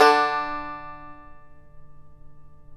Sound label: tar; Roland - LA Composer vol. 3